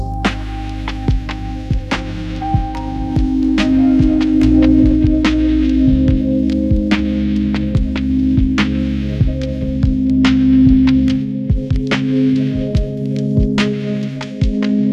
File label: soft focus lofi piano, ringtones